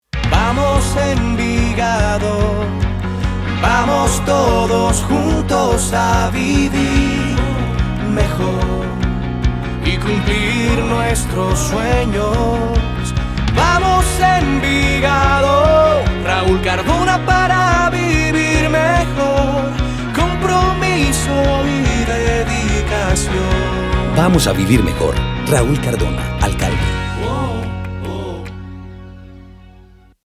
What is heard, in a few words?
JINGLE